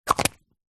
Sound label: household insect repellent roll on plastic lid place on; zapsplat